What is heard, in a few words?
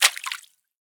pistol drop puddle; STALKER; IWP